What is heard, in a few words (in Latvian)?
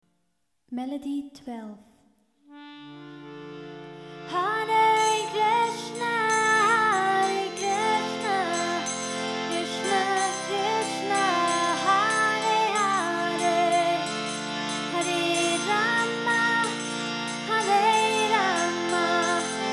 hare krishna melodija, easy harmonium; sankirtan; badzan